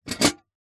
household teapot metal old pick up from hard surface